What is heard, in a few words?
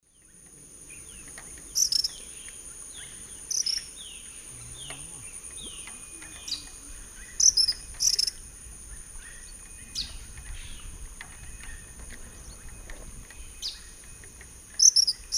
Tyrannus melancholicus, calls; S. America; Eastern Lowlands